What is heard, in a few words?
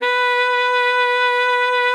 B4, alto sax; Xsample VOL-2